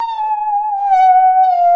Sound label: saw danger, music, zombat